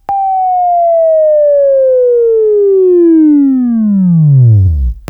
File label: Buzz; Labview-Program; Sweeping Training program